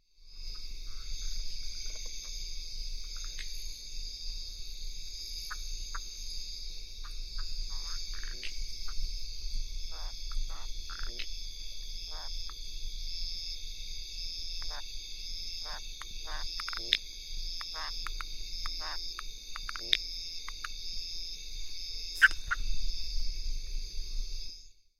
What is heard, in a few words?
burp growls